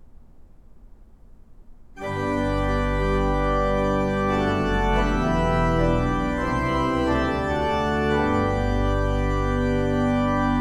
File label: trompette en chamade, organs